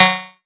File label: Synth Shot F#